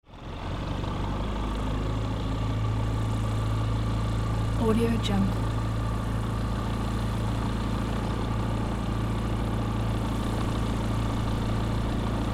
prop plane approach and stop

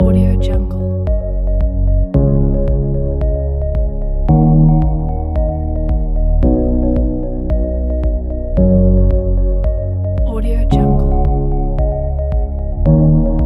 chill ambient corporate background

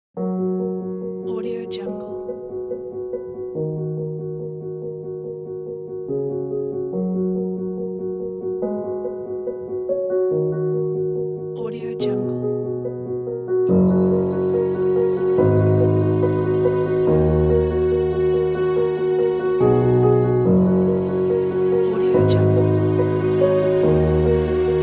storytelling

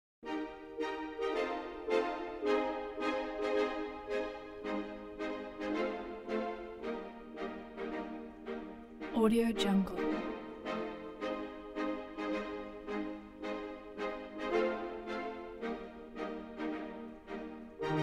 symphony orchestra harmony

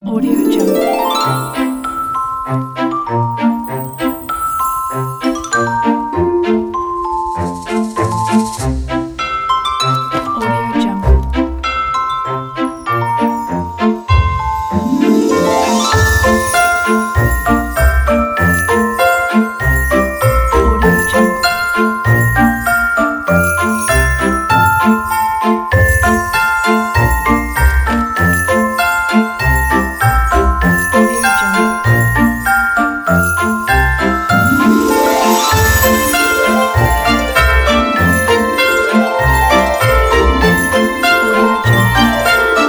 short christmas story

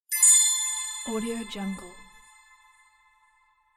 gold shine

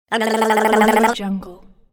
minion tongue jeer

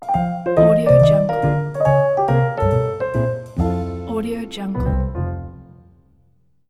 jazz logo; bluejaystudio

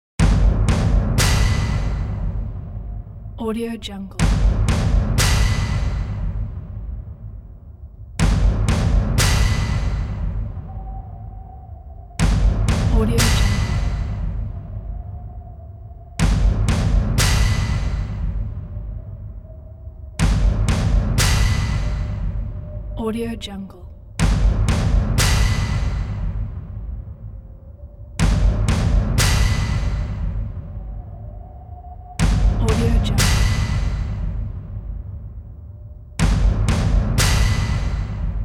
percussive horror background

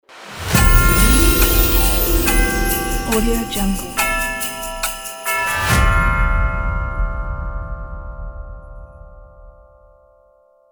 new year logo

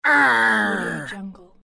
female roar argh

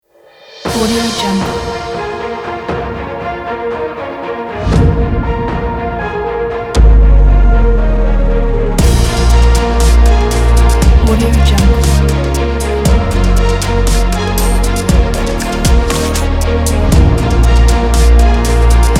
epic action series intro

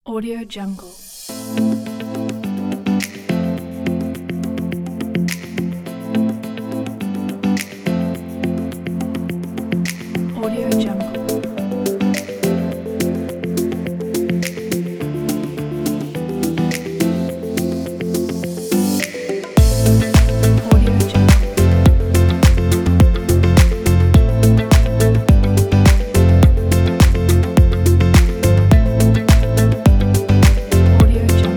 fashion pop presentation

